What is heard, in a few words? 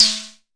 Arturia MiniBrute prc